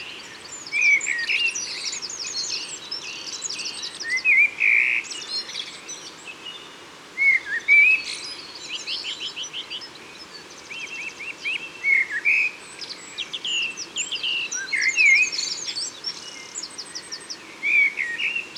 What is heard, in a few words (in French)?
Merle noir; Isere; Concerts naturels de l'Isere; Studio Les Trois Becs